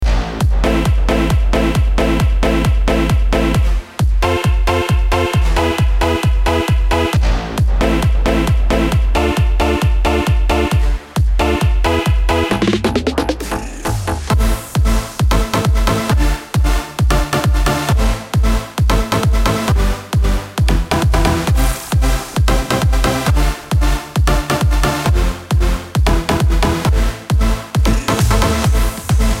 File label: techno; סט קורג